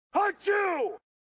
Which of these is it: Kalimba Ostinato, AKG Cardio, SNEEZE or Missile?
SNEEZE